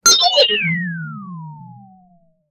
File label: cartoon stun sound loud